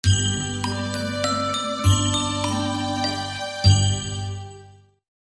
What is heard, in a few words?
Music Box